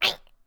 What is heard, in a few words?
die; capri